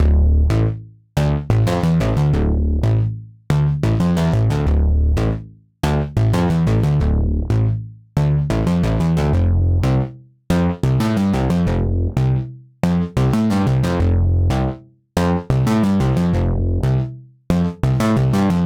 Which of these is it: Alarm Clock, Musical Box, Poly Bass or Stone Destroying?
Poly Bass